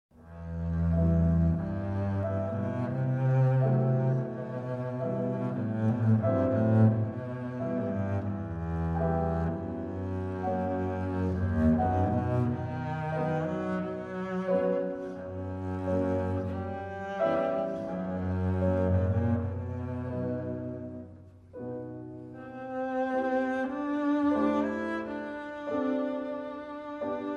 Sonata for Cello and Piano in E Minor - Allegro non troppo